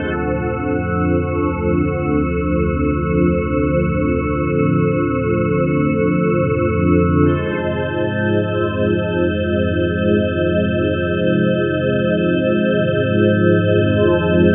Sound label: Pad 132 BPM D, Club Techno; Zero-G; Groove Loops